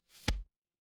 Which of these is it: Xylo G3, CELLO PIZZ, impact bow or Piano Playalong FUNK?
impact bow